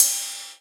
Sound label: Rides, Cymbals